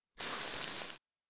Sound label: pl burn pain, player